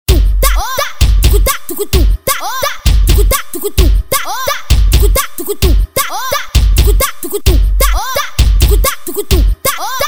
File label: beat